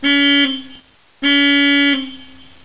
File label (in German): hupe, museum; AUER